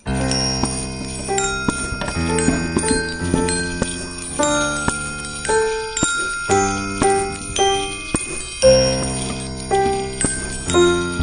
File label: sine mixdown no intro